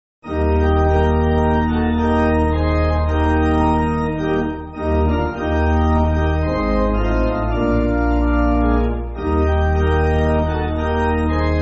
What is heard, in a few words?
Pipe, church music; Come O Sinner; Rasonaivo